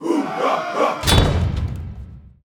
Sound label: complete fortress